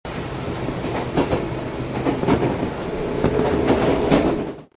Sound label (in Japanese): densya